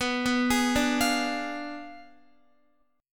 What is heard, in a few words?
B Diminished 7th B down Guitar; arpeggiated; tuning 4F,4A,5C,5G,5C,6E